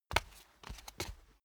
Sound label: step barefoot wood parquet scuff; menschen; schritte